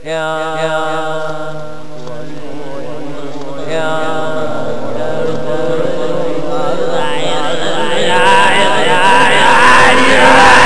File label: scary